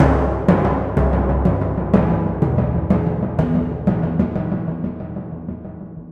Timp

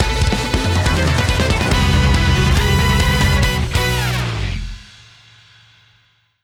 Battle (Victory); paper mario the thousand year door complete; Hooktail

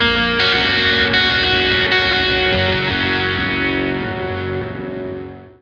Lake Hi Gtr G flat

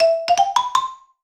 Xylo Pos; No Time to Relax

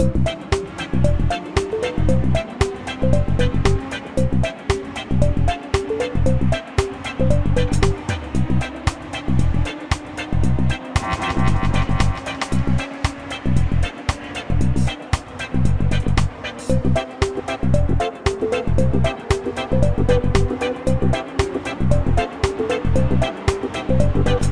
Beach (Fast); Pocoyo Racing; wii

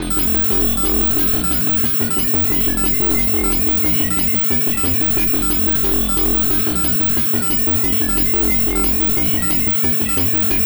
Exit loop; rive wreck hack die retry; ps4; Crusherchamber

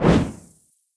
Poof

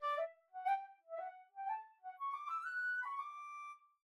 Flute B

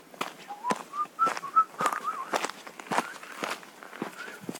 Worker Whistle; Prison Architect

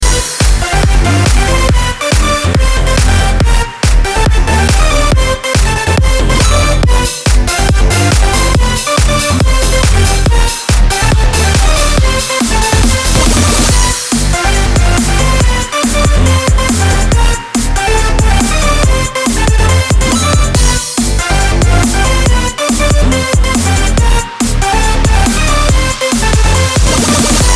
Boss Stage

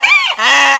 donkey hee haw

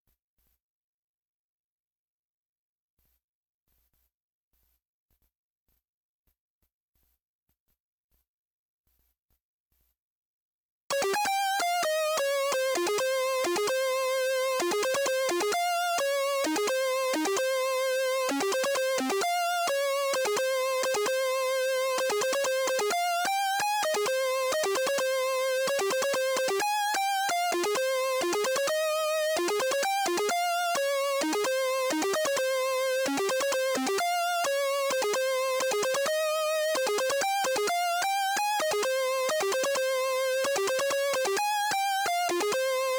Melody; chippy

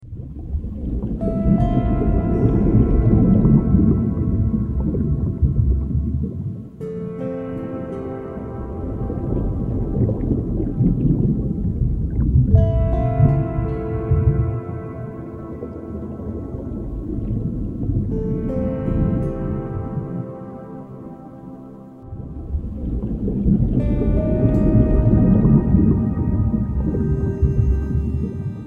Underwater; Fishing Strike; Android iOS gamerip 2018

